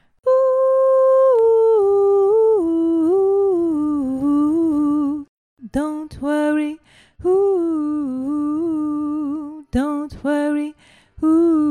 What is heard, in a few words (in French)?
femme voix